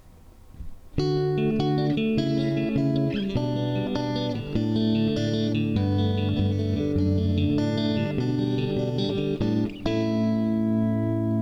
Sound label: reverb